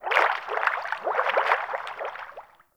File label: Fish Tank Movement; Warframe; Hub; Liset Personal Quarters